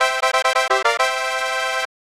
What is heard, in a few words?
Bonus Fanfare; SMW2YI